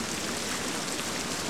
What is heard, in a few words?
Water Mill